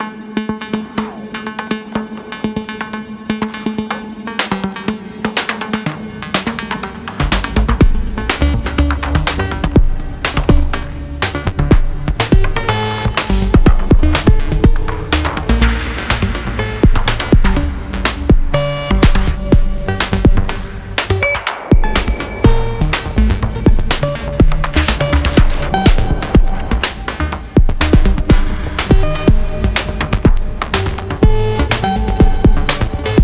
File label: Bird Modulation; Andrew Huang; In Full Effect